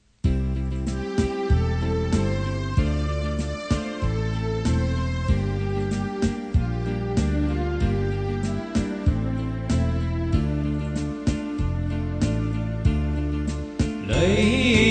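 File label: soprano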